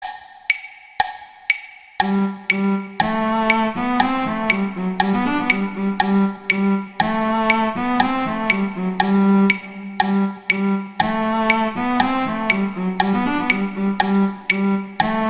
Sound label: Cello II; Duet 8; Mozart; Duets